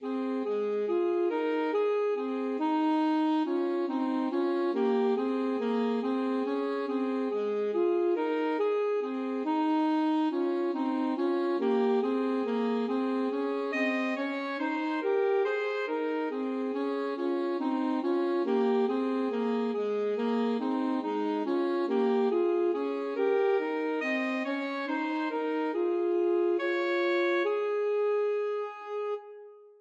alto saxophone duet

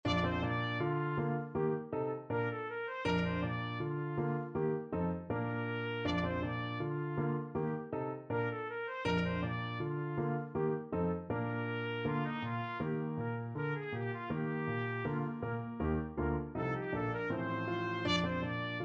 gavotte, trumpet; bach; bwv1012

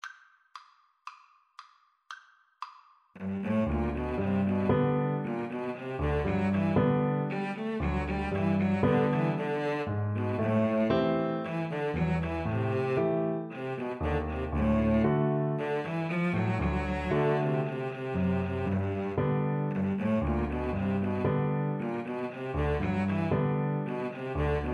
violin cello, Violin-Cello Duet

